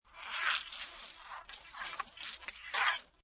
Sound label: CPU Voice